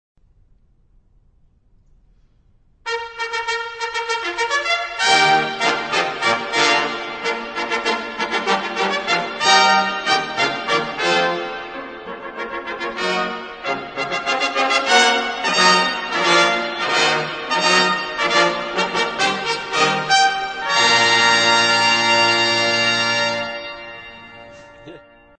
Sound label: London Trumpets